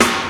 snappy snare single hit a sharp key, steel snare drum sound tuned to the A# note